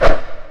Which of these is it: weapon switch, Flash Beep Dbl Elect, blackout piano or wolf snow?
weapon switch